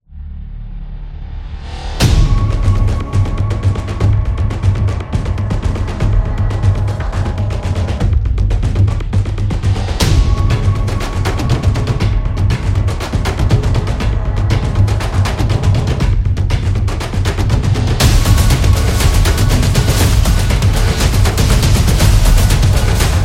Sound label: This Is Suspense, fear